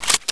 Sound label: SMG clip in, weapons